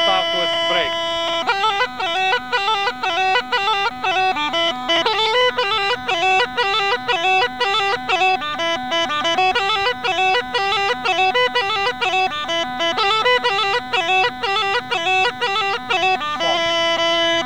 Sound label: Break, Rodope Gajada; Lesson Recordings; Vasil - Rodope Gajada Lessons